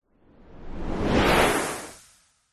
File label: whoosh fast into hiss; sound design; zapsplat